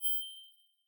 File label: notify; Scrat